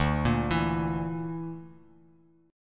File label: D Suspended 2nd Sharp 5th D Bass Standard; x,5,8,9; arpeggiated